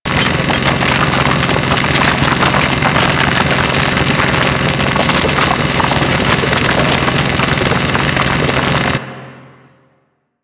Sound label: Excavator Drill Loop; Missions